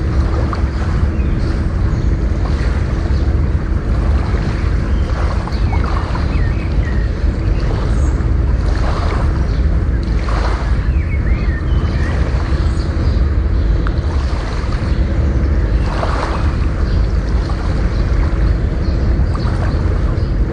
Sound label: Harbor Ambience; fisk city